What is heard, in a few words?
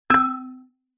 impact metal hollow tube